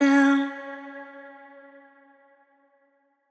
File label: Wet One Shot, C#, Vocal; Tonal One Shots; Cymatics; Euphoria Vocal Sample Pack